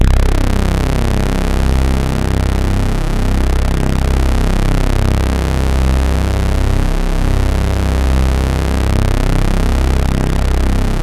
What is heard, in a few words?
OH BEE, KURZWEIL; BASIC-KW2000